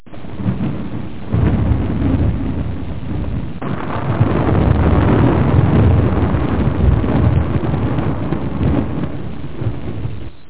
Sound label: R thund; rosemary